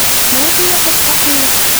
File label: noise add